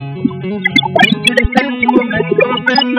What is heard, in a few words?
semi-blind estim guitar; WASPAA11